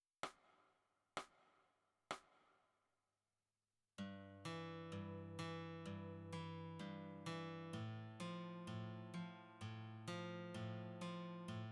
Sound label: Guitar